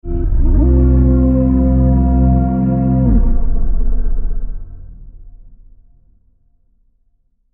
Space Hatch, Futuristic hatch Door opening; Doors

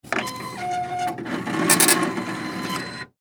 Time Card Puncher, Office, Time, Card, Puncher; Miscellaneous Office Sound Effects; BlastWave

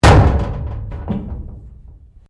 Door Pivot Interior Metal Cabinet Close; Various doors; Miscellaneous Doors

